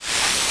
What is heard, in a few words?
spid ATTACK; The Elder Scrolls III - Morrowind